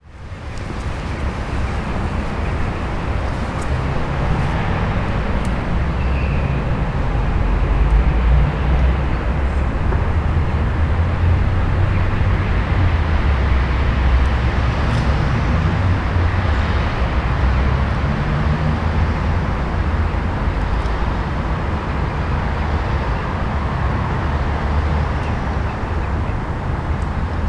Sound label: Ambient City